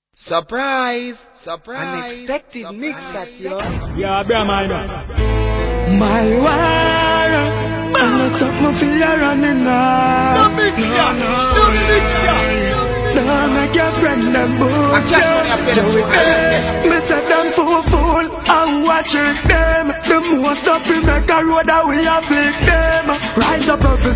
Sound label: gangsta